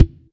processed kick; Zero-G Deep House; single hits

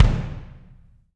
BD, Drums; Bob Clearmountain Drums I

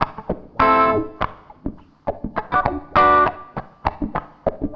WAH, wah wah gtr

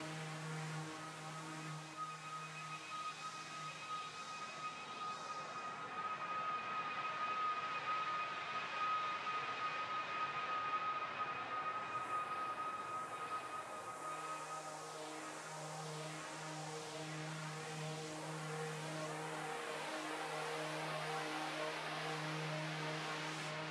air pad, Smooth RnB, Ebmin; Big Fish Audio; Legendary; Happiness

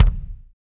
BD, BD REAL

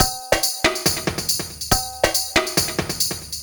Addon Loop, Drum Loops 140bpm, Perc Loops; Trance; Zero-G Trance Inducer; 90 s Sample CDs

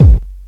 KICK, Big Beat; Big Beat Samples & More; AKAI

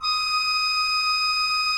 D5, Cathedral Organ; ILIO; Propeller Islands

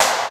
NEW SD, MOD. DRUMS; East West; Global Sounds

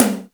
TOM HI; Back In Time Records; Big Bang; THE ROOM

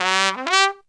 TRUMPET FX, Phantom Horns; Zero-G

